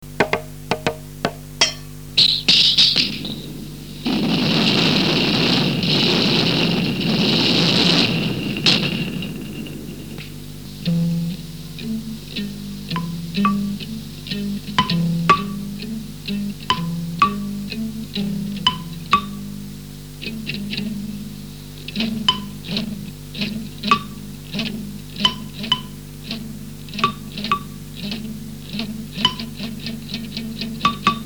instrumental

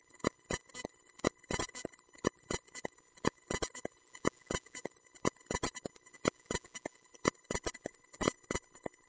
Thinkpad Z61p puppylinux forloop; Bootloop; on puppy linux; Valentina Vuksic

